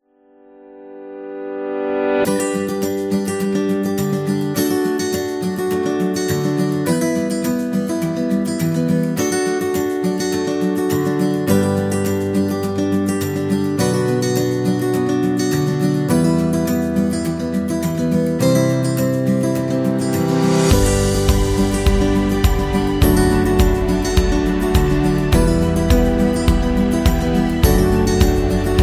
Acoustic Guitar; happy music vol 9

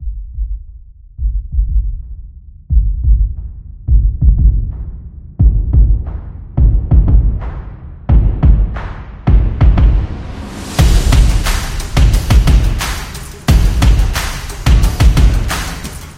Stomp Epic

